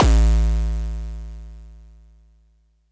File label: kick